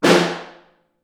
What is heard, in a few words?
SN2 Far, snare; Ableton Foundation FreePacks; Snare Designer